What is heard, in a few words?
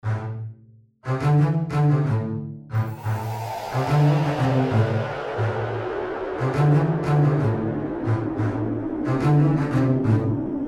BEATS, Violins